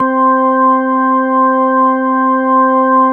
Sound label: MELO PERC